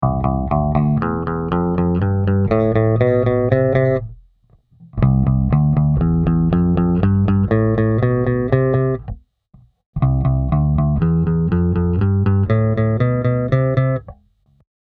ベース 音 の 違い

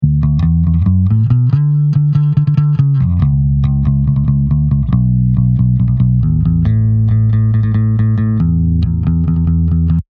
ピック比較

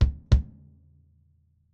Pearl Session Customのバスドラムキックを「ドッドッ」っと2発強めに叩いた

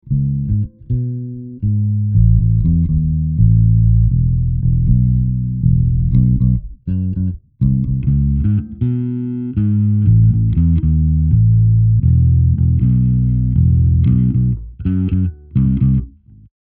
ベース エフェクター

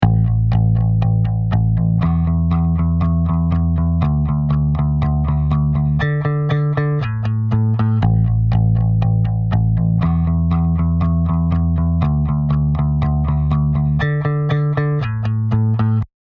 ベース ピック ノイズ

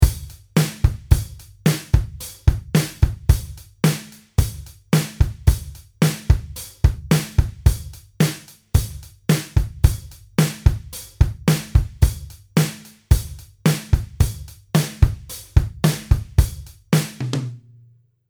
ドラムのみ

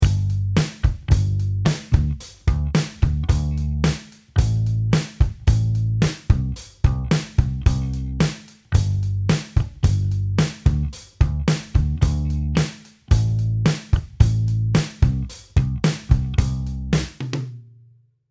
ベースライン バス

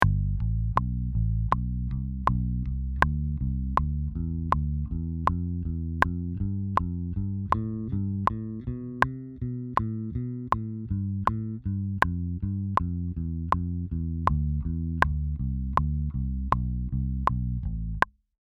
ベース スケール

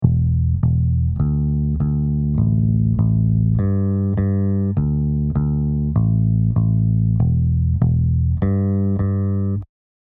適当ツーフィンガー

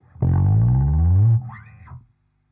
ベースのグリスアップ遅い

